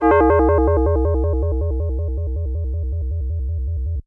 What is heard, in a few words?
FM Bell FX DX100 D4